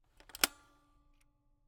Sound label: Camera, Kodak EK2 Instant, polaroid, large, plastic, springs, reset; Eiravaein Works; Vaeyan IV